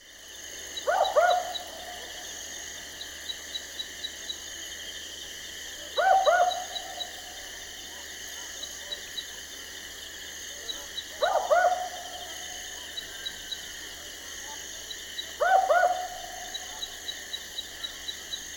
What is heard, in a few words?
Barking Owl Ninox connivens